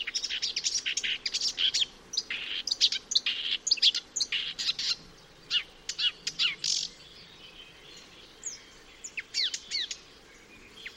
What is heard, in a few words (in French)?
rousserolle verderolle; chant